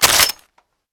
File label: car clip out, weapons; deluge